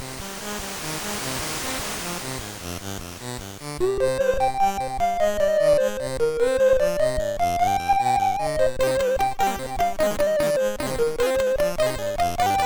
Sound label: God Bless Thee Merry Gentlemen sid MOS6581R2; Twoflower